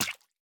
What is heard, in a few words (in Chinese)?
蝌蚪死亡声